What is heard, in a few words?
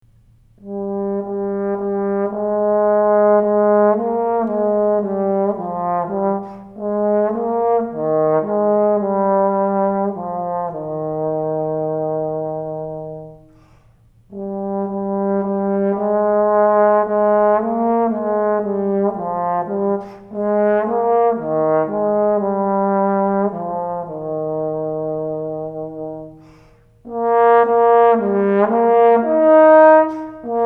Trombone Middle School